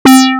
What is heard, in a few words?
mono Synth; Tone.js